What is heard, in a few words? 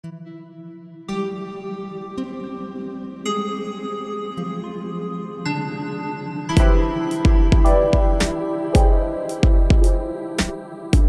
Meditation